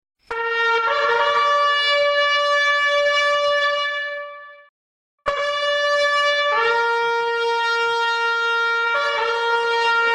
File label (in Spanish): toque cofradia solo trompeta